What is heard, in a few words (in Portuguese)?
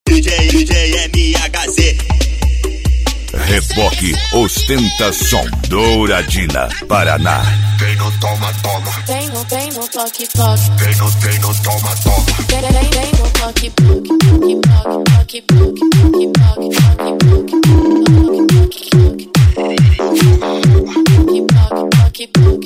som car; reboque; douradina parana; ostenta som; equipe evolusom